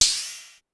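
Ride 808 Up, Drum Machines Clavia DDrum-AT Pitch Envelope; Pitch Env Various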